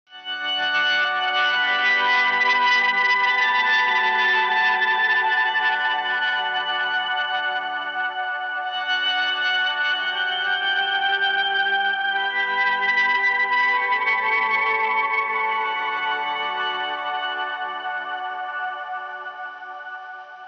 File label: Etherial guitar